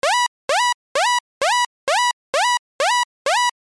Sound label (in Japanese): ノコギリ波4分打ち A設定